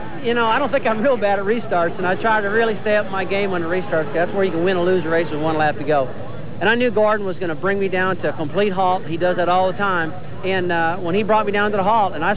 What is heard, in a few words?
shootout